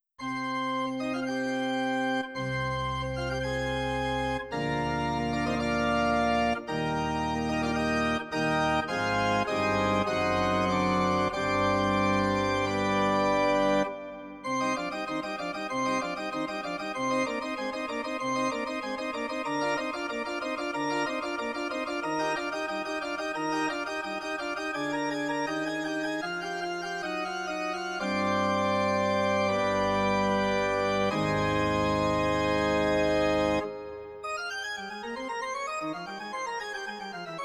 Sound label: Organ Tutti, Yamaha NP-15 pianino cyfrowe; Yamaha NP-15 WH